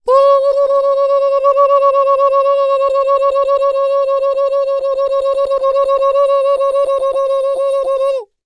Human Male Native American War Chant

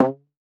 Household Rubber Band Twang Low

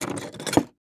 Industry Tool Box Remove Rubber Mallet

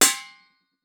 Impact Bowl Metal Hit By Wooden Stick; Impacts

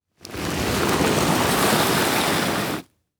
Impact Body Drag Through Leaf Debris

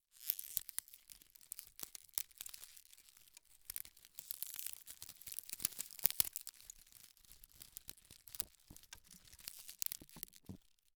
Sports Basket Wicker Basket Creaks

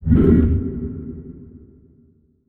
Multimedia Internet CD-Rom Flash Pop Up

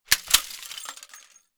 Plastic Frame Debris Falling On Cement Floor; Crashes

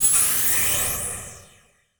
Title Transition Laser Long; Adobe; Production Element

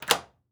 Household Lock Deadbolt Unlock

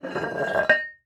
Toilet Back Take Off, Household

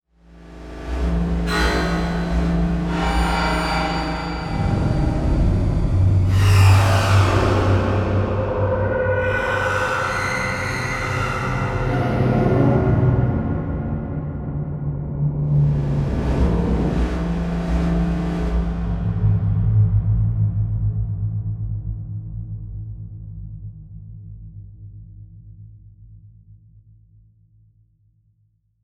Electronic Whoosh Digital Reverse Pangs; Module Production Element Title Imaging

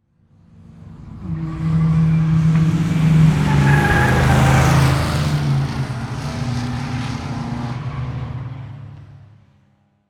Transportation Car Race Car Drive By Turn

